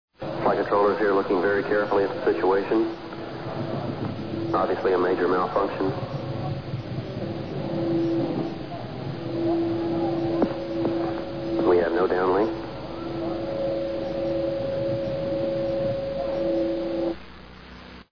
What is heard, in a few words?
major malfunction